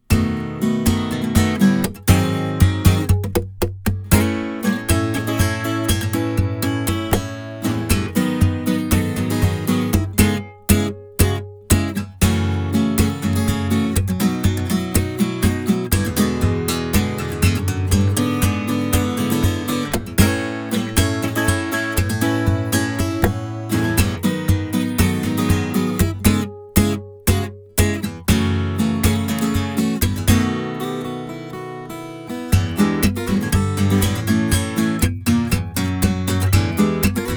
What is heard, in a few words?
AKG C451B